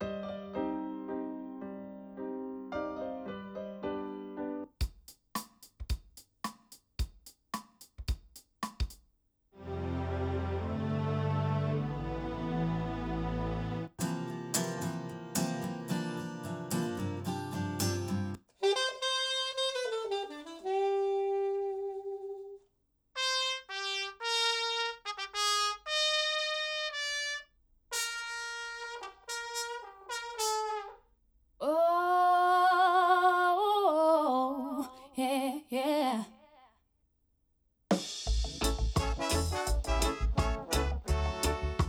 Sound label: AKG C414 XLII ocho